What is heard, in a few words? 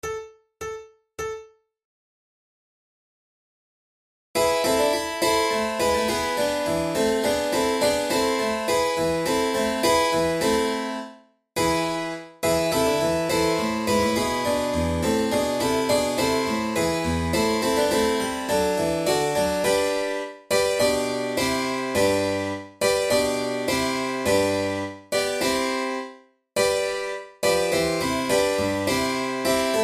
vivace, flute